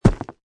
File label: Hard Object Drop Gravel, Heavy object drop on gravel; Drop on ground, thud of object falling on gravel, earth or dirt; Lynne Music